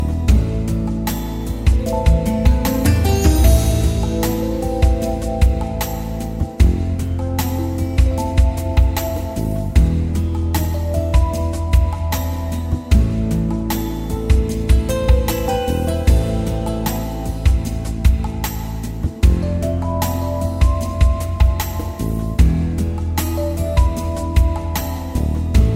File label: to distant places accompaniment, ocarina